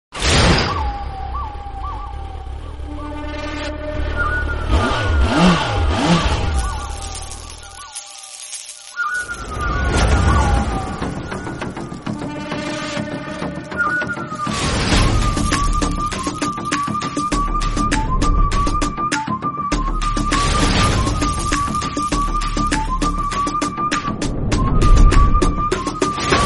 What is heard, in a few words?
Bgm Ringtone; Valimai; Thala Ajith Kumar